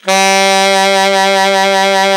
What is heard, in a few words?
ALT SAX, D3; Best Service; Alpine Volksmusik